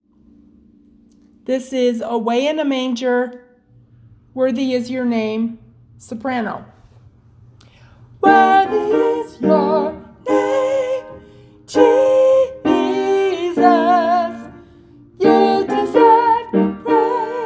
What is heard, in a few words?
Away in a Manger soprano